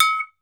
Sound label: TOKE BEL, African Percussion; E-MU Producer Series Vol. 7 – Old World Instruments